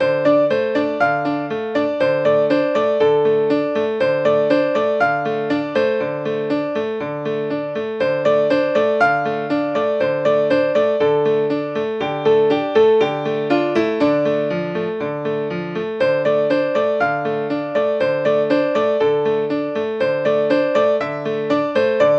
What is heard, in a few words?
akoustik Bosendorfer recording studio, piano; native instruments; the grand